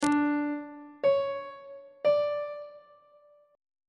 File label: c double sharp 8th interval pitches on treble clef